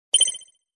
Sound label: start game